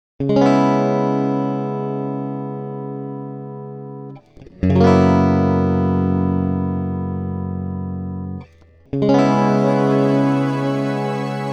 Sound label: Electric Guitar; Luminance